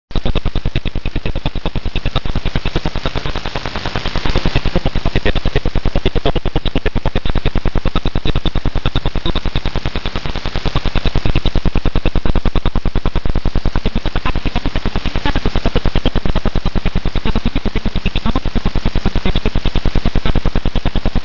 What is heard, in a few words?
maybe jamming